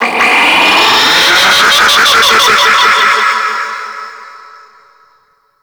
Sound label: Space Drone Attack; Warframe; Grineer